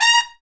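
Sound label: BRS TPTST, brass trumpet stabs; Roland L-CD702; BRS Tps Stabs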